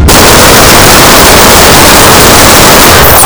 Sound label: glock sound